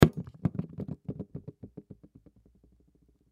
Thermos Set Down, Thermos Empty Set Down Wobble Wood; Set Downs; Household; Blastwave